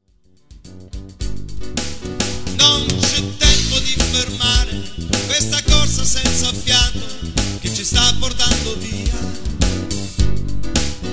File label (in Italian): piano bar